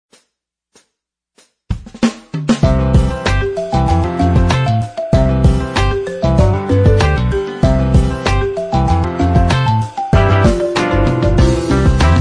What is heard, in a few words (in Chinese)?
背包 主界面背景音乐